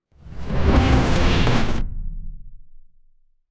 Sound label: cinematic sfx for a home, chair